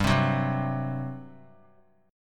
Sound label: G Major 7th Suspended 4th, G, down